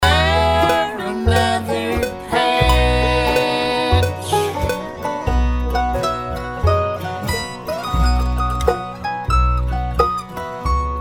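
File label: mandolin solo half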